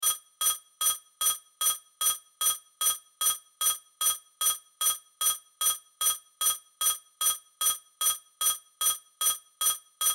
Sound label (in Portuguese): amostra de pulsos com periodicidade